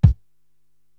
BD Deafness, Korg TR-Rack drum machines

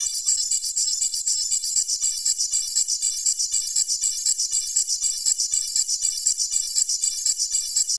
High Drone; Electronic Point Blank

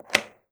CASE CLOS; AKAI Anime Sound FX

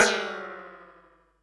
Perc, Percussion and FX; Pearl SC-40

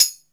TAMBOURIN

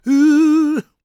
GOSP MALE, SOLO; Vocal Planet Vol1 Gospel

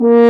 brass, F.Horn Solo; French Horn; F.Horn 4 Sh

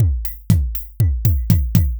beat Fill; Anabeat; Sonic Foundry (Sony Creative Software); Prototechno

